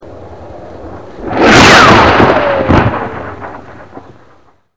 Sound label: fast from left